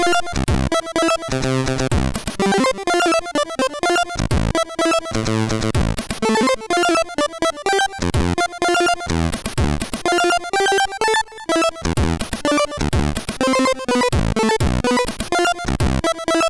Arcade, sid MOS6581R2; Commando

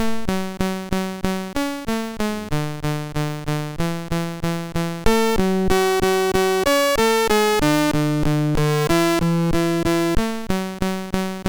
sid MOS6581R2; One Pattern

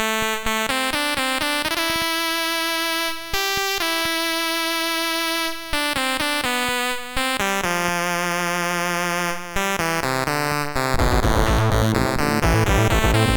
Saxophone, MOS6581R4

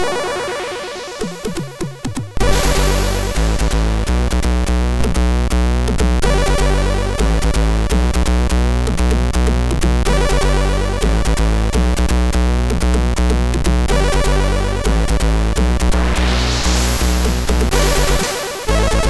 sid, MOS6581R3; hvsc

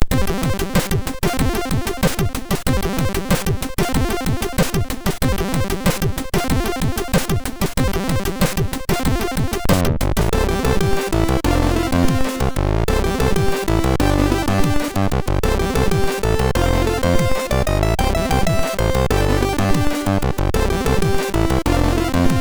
Newspress, MOS6581R3

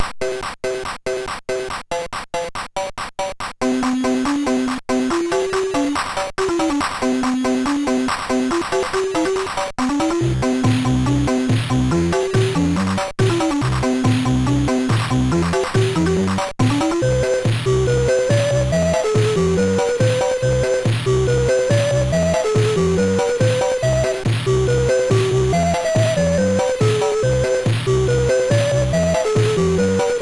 Easy Melody, MOS6581R3

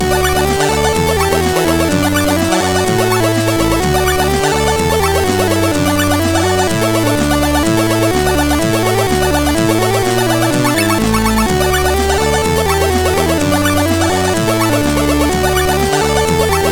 Tune, sid CSG8580R5

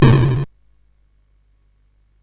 Dark Castle, CSG8580R5

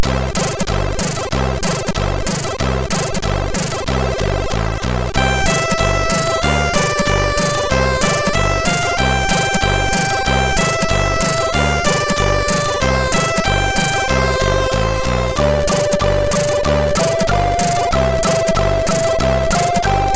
Children Songs, sid MOS6581R3

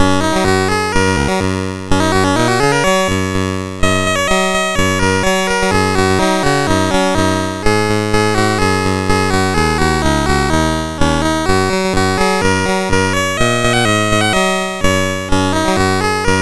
sid MOS6581R2, GAMES; Changs Adventure

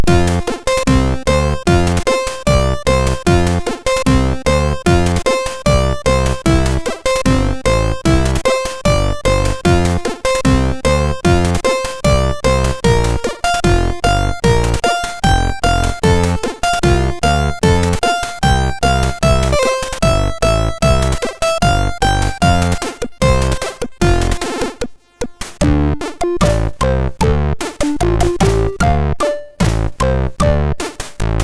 MOS6581R2 sid

